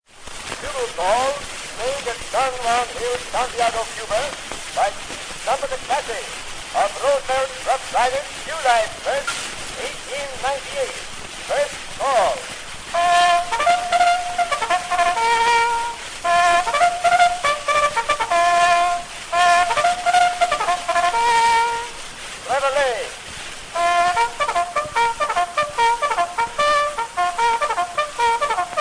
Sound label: Bugle Calls